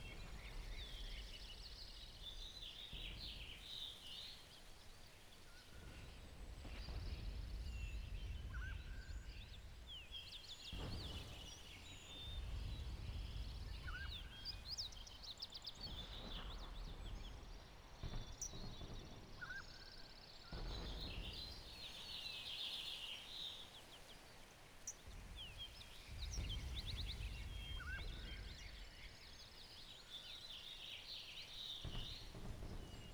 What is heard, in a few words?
forest; WAR